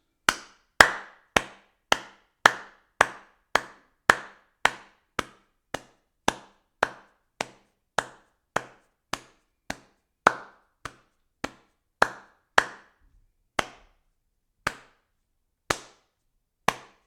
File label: hand claps close